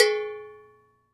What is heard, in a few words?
Cowbell, World Percussion; Cymatics; PHARAOH Beta Pack; Pharaoh Premium Drum Samples (BETA)